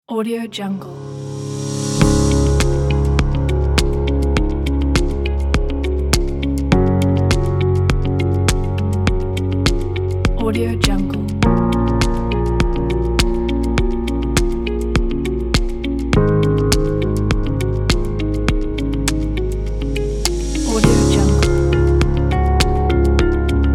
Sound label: technology ambient pack